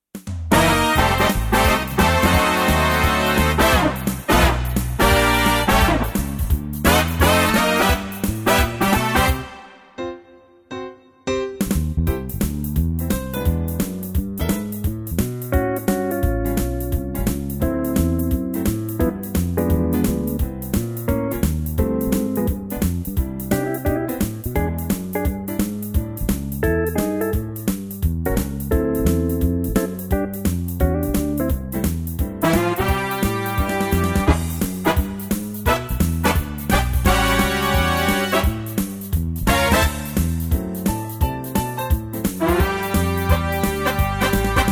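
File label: C, backing track; key - C - vocal range - C to D; Wonderful old swinger in an original Livetrak arrangement..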